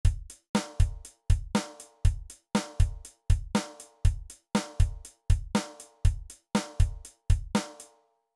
8beat

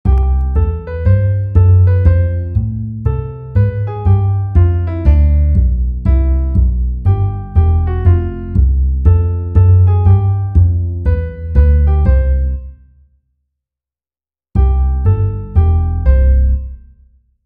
jazz practice

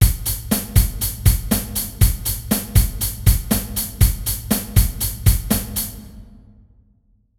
melody

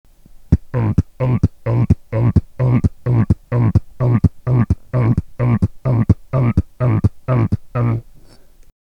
throat Bass

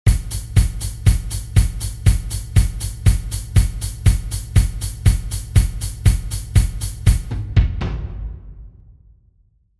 pattern fill

